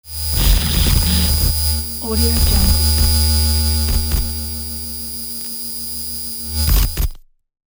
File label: radio transform glitch